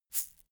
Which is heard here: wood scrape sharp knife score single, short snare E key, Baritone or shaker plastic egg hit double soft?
shaker plastic egg hit double soft